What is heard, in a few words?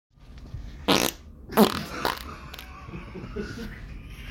giggle quack